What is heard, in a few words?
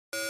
Square Wave Beep. Short Square Wave Beep With FM Modulation.